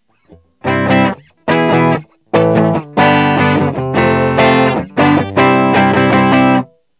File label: clean